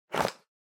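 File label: Sensu Close, folding fan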